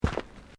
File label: Footsteps Boots Gravel Fine Step; Footstep Foley; Human